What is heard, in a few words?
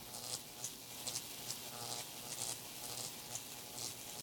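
electricity loop; smite; pele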